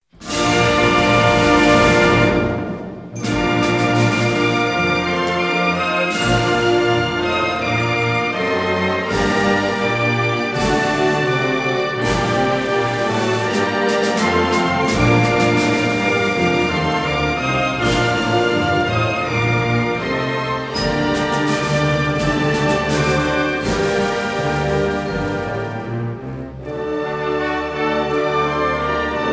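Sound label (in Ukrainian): гімн України instrumental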